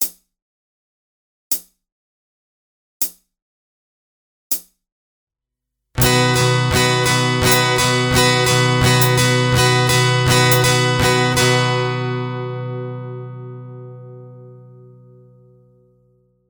Sixteenth Notes capo 4 SLOW, strumming; Ultimate guide to strumming book

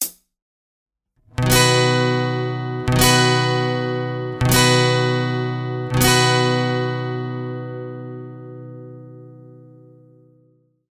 Quarter Notes, capo 4, SLOW; Ultimate guide to strumming book

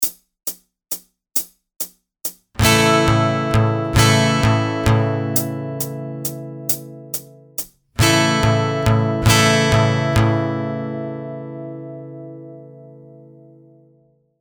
The 6/8 Groove, capo 2, SLOW; Ultimate guide to strumming book

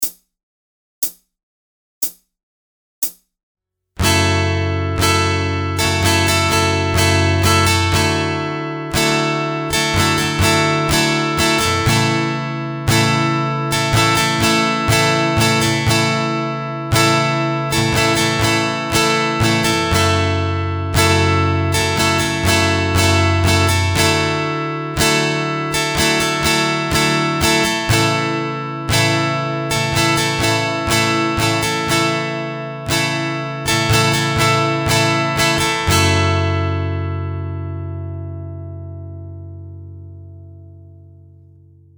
The Modern Strumming Pattern, capo 2, SLOW; Ultimate guide to strumming book

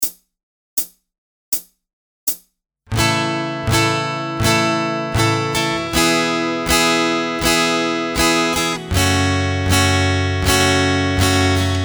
The Go-to Strumming Pattern, capo 2, SLOW; Ultimate guide to strumming book

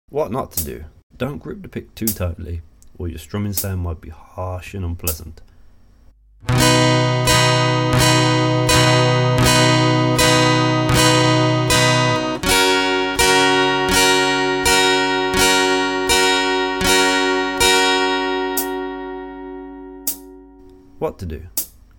Tight Grip Vs. Soft Grip, capo 4, SLOW; Ultimate guide to strumming book

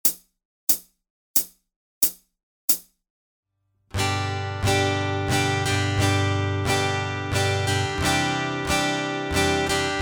Chords, guitar; no fingerstyle; Amazing Grace; 1 MINUTE MELODIES STARTER

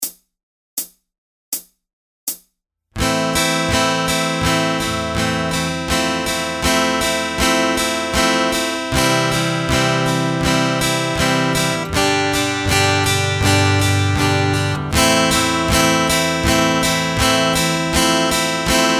Partial Dynamics, guitar; Ultimate guide to strumming book

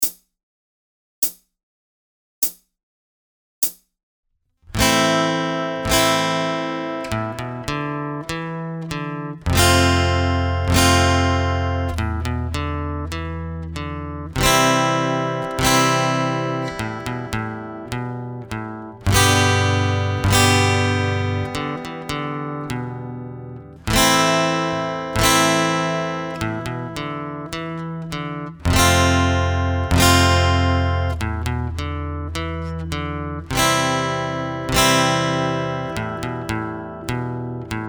A Stylish and Groovy Bass Riff SLOW, guitar; Ultimate guide to strumming book

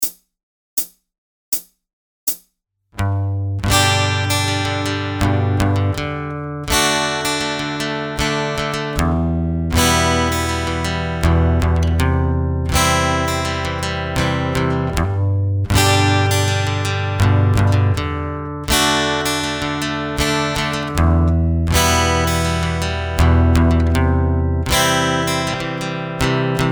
Pick Strumming the Modern Strumming Pattern; Ultimate guide to strumming book

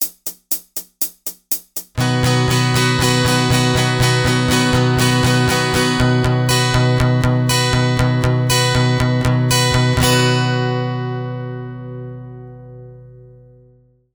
Bass-Treble Strumming, capo 4; Ultimate guide to strumming book

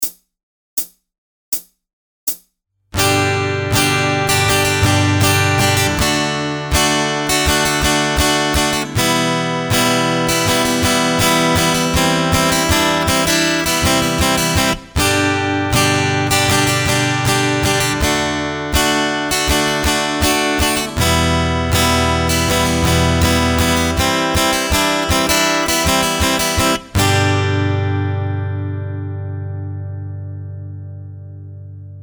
The Most Intricate Strumming Fill Ever, guitar; Ultimate guide to strumming book

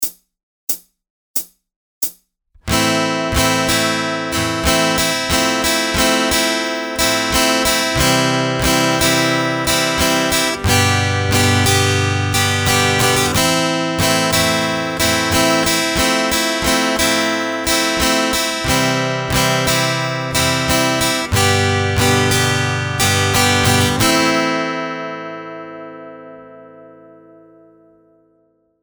Variations of the Ultimate Strumming Pattern SLOW; Ultimate guide to strumming book

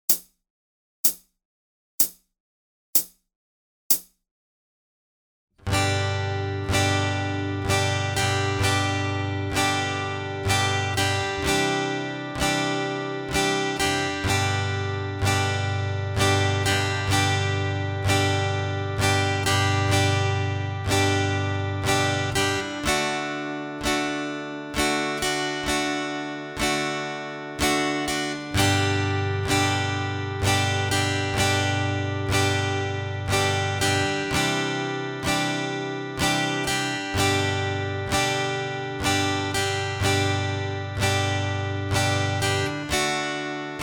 Chords, SLOW, guitar; no fingerstyle; Amazing Grace; 1 MINUTE MELODIES STARTER

